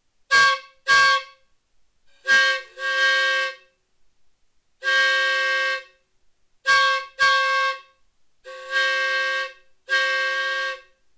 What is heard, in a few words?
chime whistle, steam whistle; mechanics